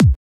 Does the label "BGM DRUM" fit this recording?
Yes